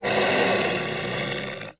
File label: wolf